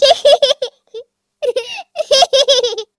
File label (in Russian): rebnok hihikaet shkodlivyiy